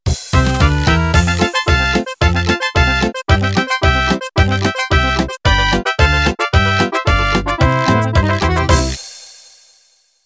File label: Mariachi End